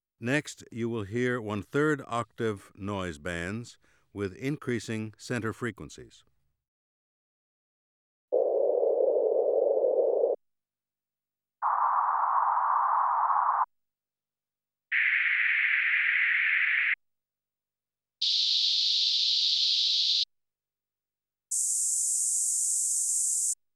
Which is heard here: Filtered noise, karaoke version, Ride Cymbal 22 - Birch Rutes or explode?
Filtered noise